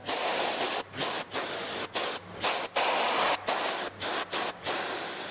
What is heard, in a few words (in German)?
druckluftpistole